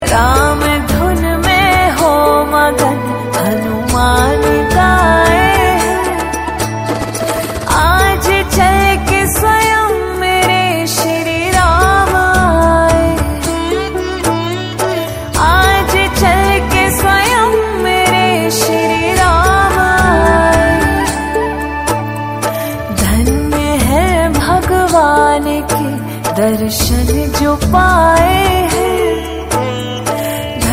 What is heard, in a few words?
Ram Bhajan